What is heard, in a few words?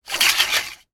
technology microphone stand telescopic arm movement